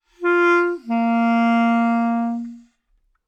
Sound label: clarinet phrase Bbmaj 100bpm; bespoke - Floral v.2